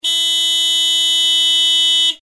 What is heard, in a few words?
signal horn s70 low; hella